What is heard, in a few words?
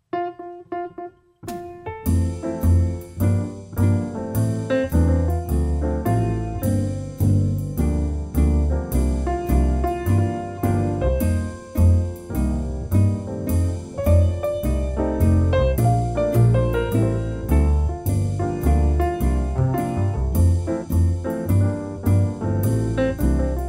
Pianist